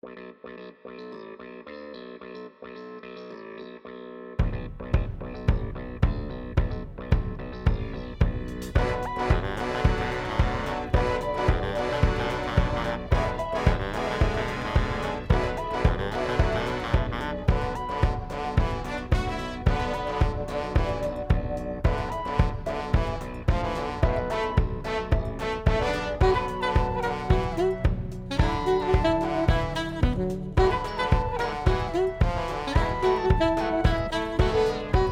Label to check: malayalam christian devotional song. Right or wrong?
Wrong